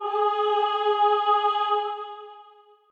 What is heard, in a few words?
choir